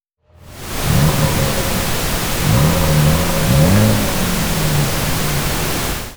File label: Rev pink